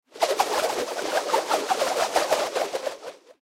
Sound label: Rope Whoosh Spin, Rope Whoosh Spin Twirl; Rope Whoosh Spin Twirl Reel; Rodeo Sound Effects; Backgrounds; Foley; Blastwave